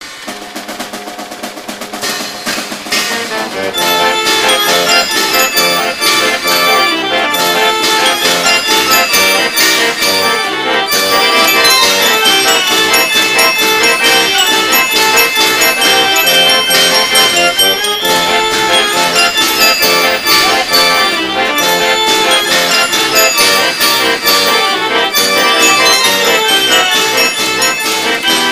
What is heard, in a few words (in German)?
Kirmes Orgel